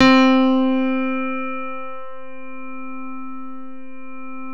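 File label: CP-70 soft C3, El. Grand; E-MU Producer Series Vol. 2 – More Studio Essentials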